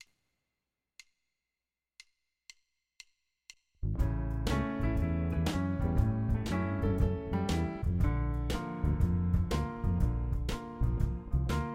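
Major minor chord regions 50 bars Country 120bpm 4 4 Swing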